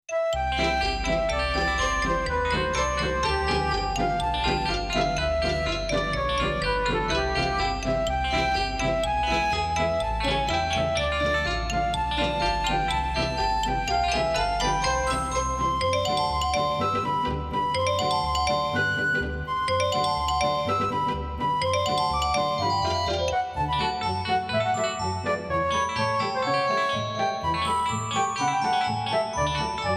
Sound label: cartoon convivial theme